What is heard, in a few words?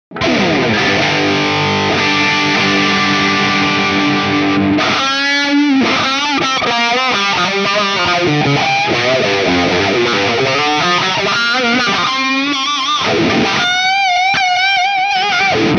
Lead, wah wah